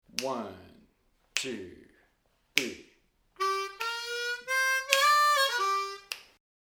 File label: Lick Slow, harmonica